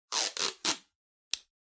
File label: Sellotape Pull Rip